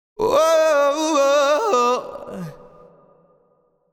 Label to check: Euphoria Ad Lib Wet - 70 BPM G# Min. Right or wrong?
Right